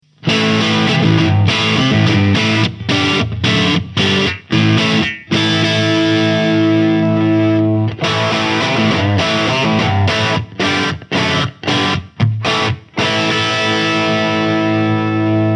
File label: Strat Marshall 2004 Crunch